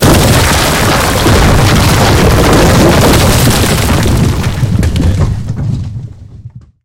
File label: building fall big